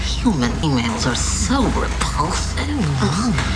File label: hum fem; trek; tng